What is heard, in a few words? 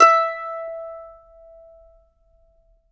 MANDO NOTES, E5; Sampleheads; Whole Lotta Country 1 & 2